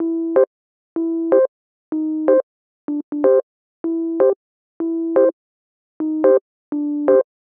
125 Rhodes Dom D, Keys, Nu Jazz House; PowerFX - Nu Jazz House

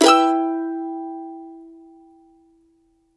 CHORDS, Blues Guitar

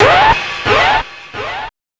Big Beat Drumloops, SPEED 140

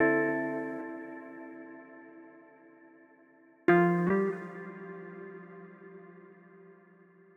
Gtr Jz Loop 130, Loungin House; Music-Fx Loops 130; Equipped Music

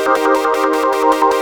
Korg, FX

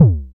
FX A, ANALOG; Sound & Vision - Gigapack II

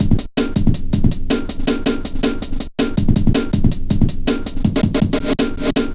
metallic stepz, drum loops

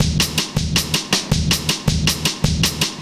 Drum Loops, Compressed; Zero-G Smoke

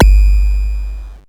BD, Mutable Instruments Anushri

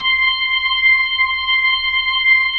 B-3 C 6, Jazz; Keyboards of The 60's and 70's

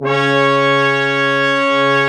BRS QUIN, Brass Sections, BRS_Quintet; Roland LCDP06 Brass Sections

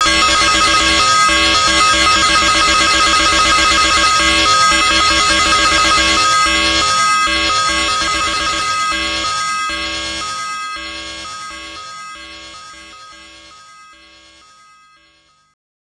FX, Drum & Bass; Sonic Foundry (Sony Creative Software) - Fluid Dynamics Drum & Bass

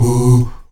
VOX AHOO, Afro Chants, Stacc Ah Oos

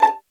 P VIOLIN A5; SOLO PIZZ ST; Greytsounds VOL-1

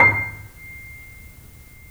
C, The Piano; InVision Interactive - The Piano; BOESINGSOFT

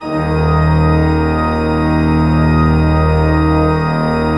D1, cathedral organ; Propeller Island

